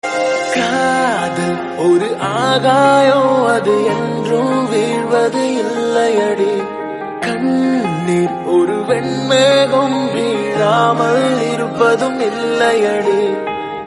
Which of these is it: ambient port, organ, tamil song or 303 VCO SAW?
tamil song